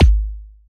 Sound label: Fat Drum